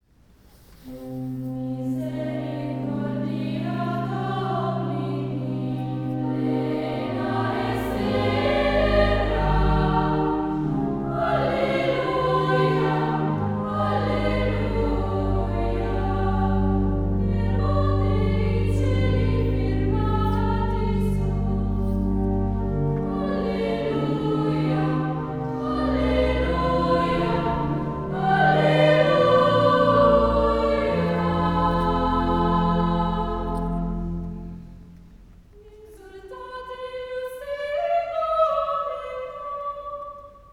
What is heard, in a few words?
children's Choir